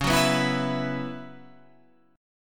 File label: Gb 7th Suspended 4th Db, chord